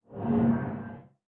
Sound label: player stat off; War Thunder